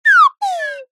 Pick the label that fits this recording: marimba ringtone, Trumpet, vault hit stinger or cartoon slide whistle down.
cartoon slide whistle down